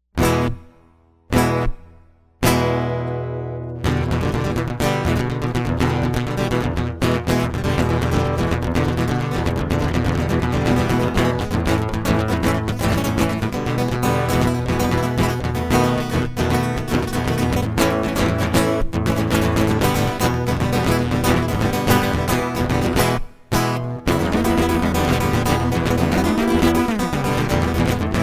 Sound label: guitar jam